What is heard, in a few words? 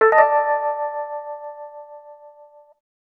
D&B Keys; neuro